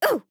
Mage Grunt Hit; Realm Royale; Radon Flux

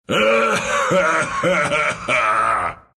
laugh, ru; Wraith

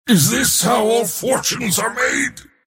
last hit pain; mars

